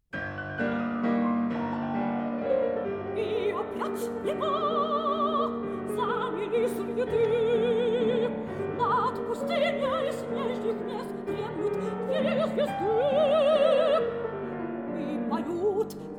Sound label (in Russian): Vokalnyy cikl dlya golosa i fort; Denisov; Vokalnyy cikl na stihi Aleksandra Bloka dlya golosa i fortepiano